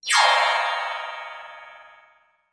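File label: Music Transition Vibraphone; mallets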